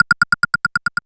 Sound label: Synth Perc; Dream Zone